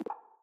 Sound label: msg out